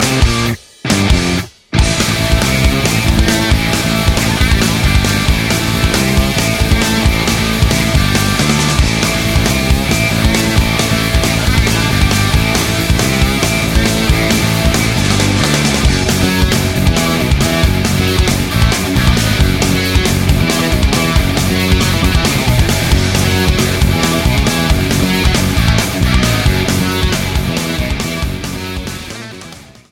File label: Solo